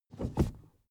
suv push forward gear across soft; verkehr transport; autos

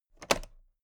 toolbox latch open soft; industrie wirtschaft; handwerk

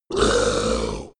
monster hit gurgle low; comedy-cartoon; soundpool

